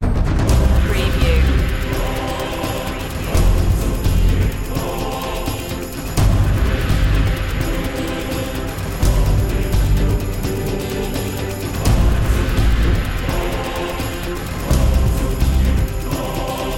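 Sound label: background choir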